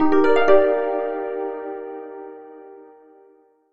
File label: get item; OneShot Fading Memory